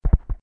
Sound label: hit ground; openmortal-0.7